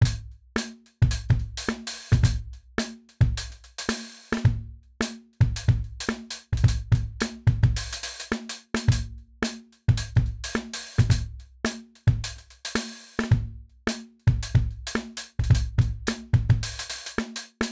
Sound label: Upbeat Just Drums